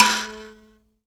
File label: Mbira; Roland LCDP11 Africa VOL-1; M'biras; Bs Mbira 1